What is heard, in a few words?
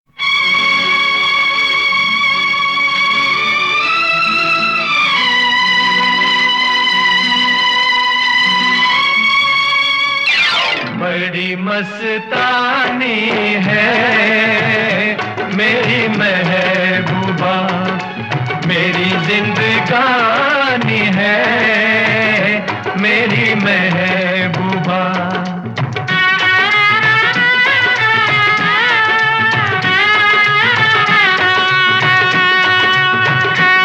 Duet